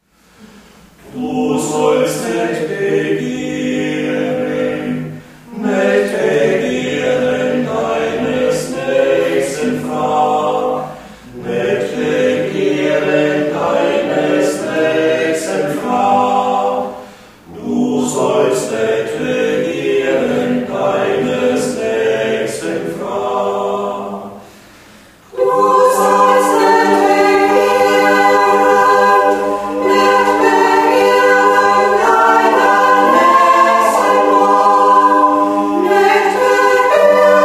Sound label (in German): Wollust Chor; bergpsalter